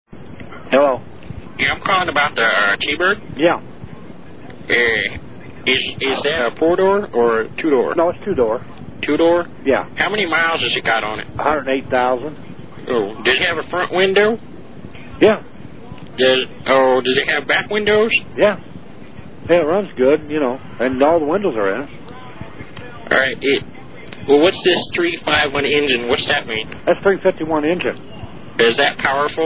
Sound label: truck windows engine; Prank Calls; Phone Losers of America Media Pack 2017; Miscellaneous Pranks